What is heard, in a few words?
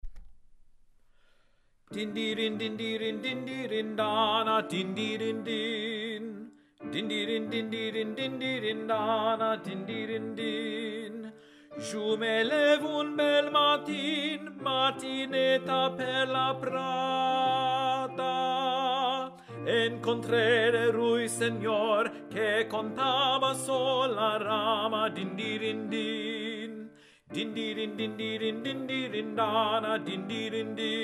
Tenor; Dindirindin, Anon.